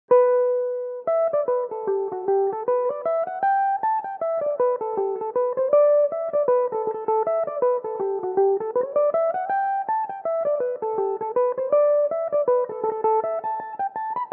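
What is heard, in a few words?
reel G major, Irish mandolin; Belmuse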